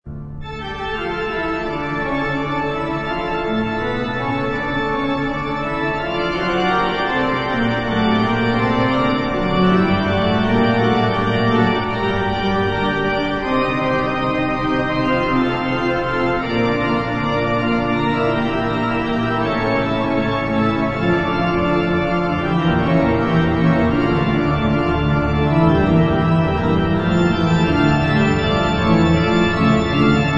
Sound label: Toccata en Fuga in D BWV 565, carillon; Johann Sebastian Bach; 1708